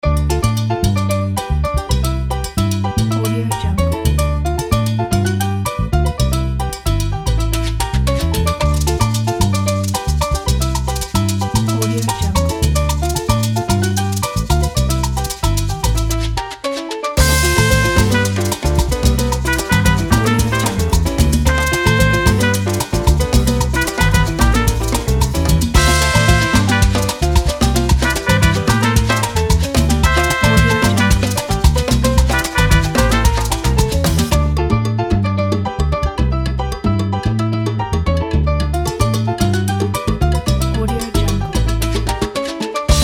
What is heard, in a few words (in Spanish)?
bailando caribe; audiobeats